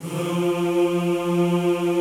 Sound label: F2-L, Classical Choir; Best Service; Extended Classical Choir